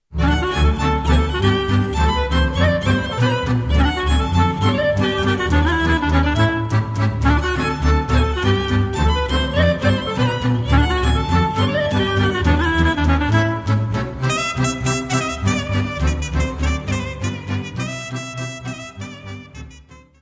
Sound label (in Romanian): Briul, Roumanie